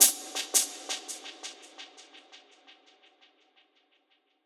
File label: FX Misc